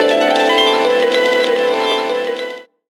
HRP TRAP; Rawcutz; Nu Forms

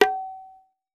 Tabla Baya; Roland BK-5; Percussion

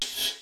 INDUSTRY HIT; Rubber Chicken Software Sample Bank; DRUMS + PERC

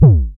FX A, ANALOG; Sound & Vision - Gigapack II

